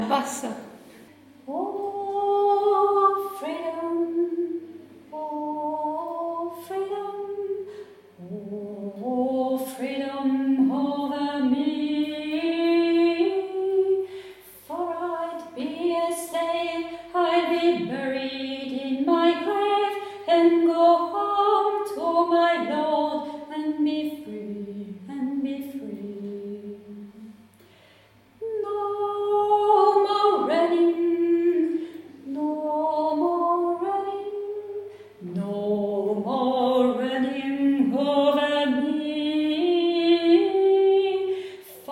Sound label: freedom basse